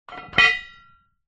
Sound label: industrial manhole cover close; zapsplat